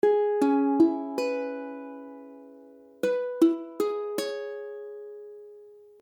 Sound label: Cism7, ukulele; C#m7